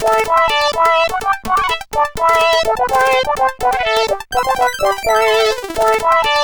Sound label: Test Medieval, CSG8580R5; Driver 14